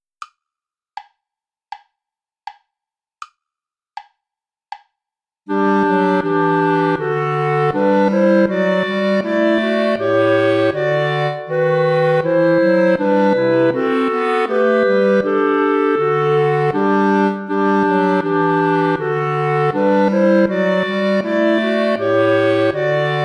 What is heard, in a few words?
play along without clarinet